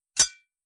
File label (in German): Kling; Gods of Akragas